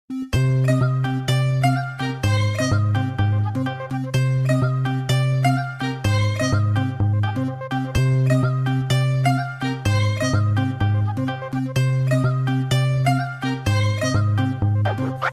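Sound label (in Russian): прикольная мелодия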